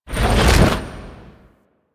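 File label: Ghost Teleport Out; Warframe; Priest Quest; Rell Ghost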